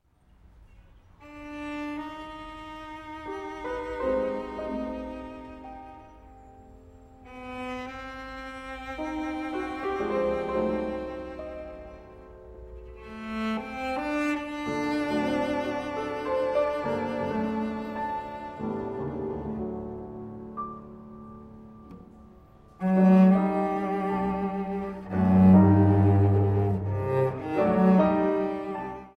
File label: Sonata for Cello and Piano in G Minor Lento Allegro moderato LIVE; Rachmaninoff; Op19 I